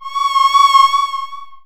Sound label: GNAT